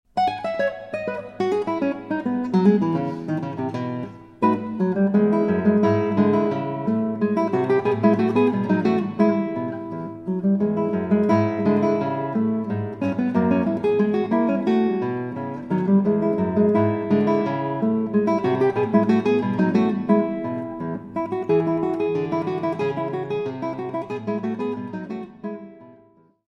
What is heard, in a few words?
guitar; usa; theentertainer